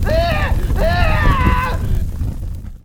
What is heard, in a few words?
Burn Human; 1944 Battle Of The Bulge